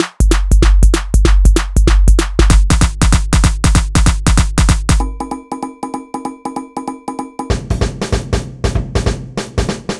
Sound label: Groove Pizza 96bpm